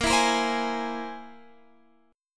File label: C Augmented 7th A sharp